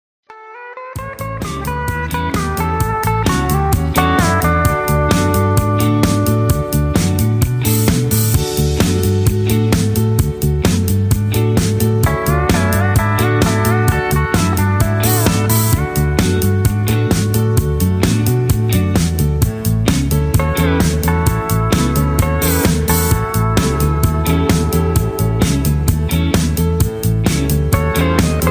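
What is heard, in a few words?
Instrumental No Leads with background vocals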